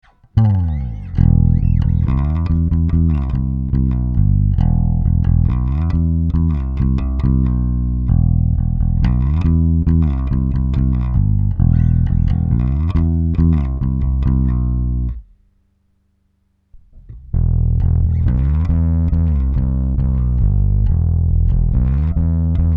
Crunch off on; MiniMega

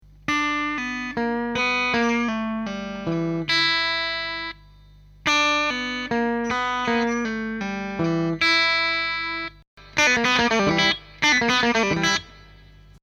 Lick

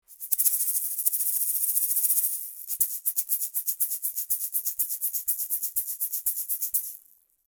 Austrian Audio OC818 Shaker 30cm

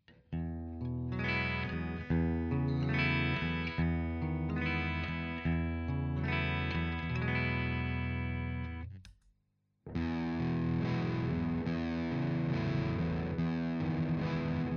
Bridge Sustain

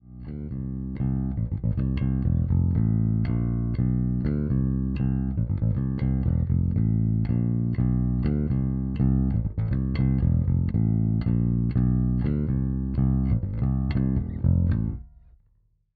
SMR 400; Zoom B2 Four

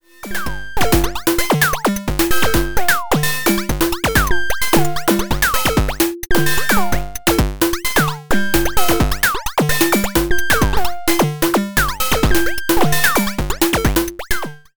Chaos Permutation Beat; ALM Busy Circuits Motto; Akemie

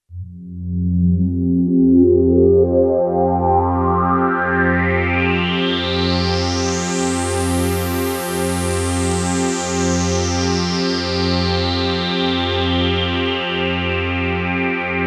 Synthesizer Sweep

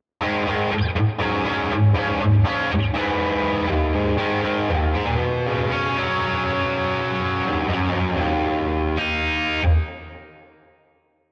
SuperPlate

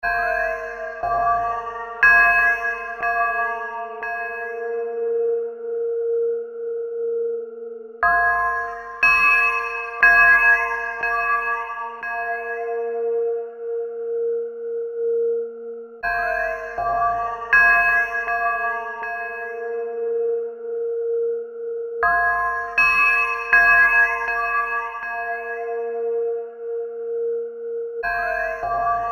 Aqua Bell 60 140

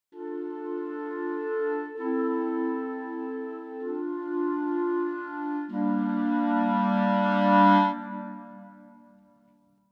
Traditional Clarinets Long Sustain; VENTO

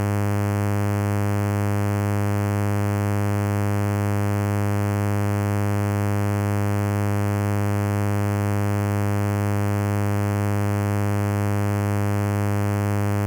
Stylophone S2 Sawtooth; Dubreq

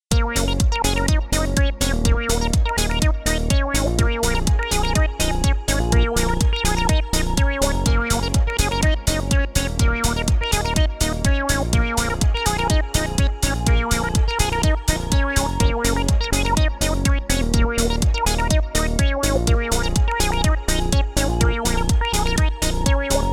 24dB Filter; Behringer Kobol

